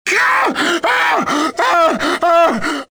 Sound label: writhe, pain death, male; Soldier Of Fortune II Double Helix; XBOX